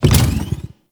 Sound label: Rev From Stop, Motorcycle; Warframe; Vehicles; Motorcycle Ninety Nine